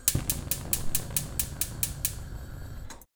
stove top; soundscrate

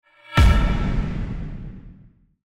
Epic Metal Hit; soundscrate

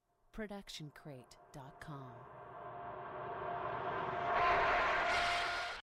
Distorted Evil Reverse Scream; SoundsCrate

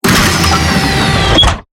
Robotic Movement; SoundsCrate

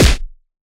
Anime Super Punch

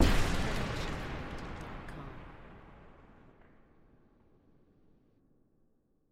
Explosion Blast with Debris; SoundsCrate

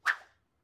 cord whip whoosh; soundscrate

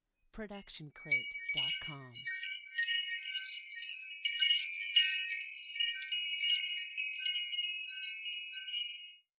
Wind Chimes B

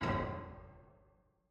Metal Footfall; soundscrate

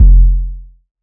electronic kick distorted; SoundsCrate

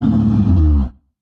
monster roar long; soundscrate

